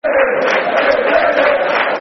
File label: Football Chants; Billy Davies; Derby County Dcfc